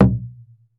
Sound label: PUEBLO, American Natives, Earth Tones; E-MU Formula 4000 Series Vol. 4